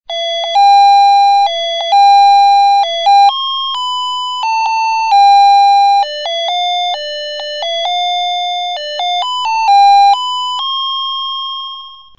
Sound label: melody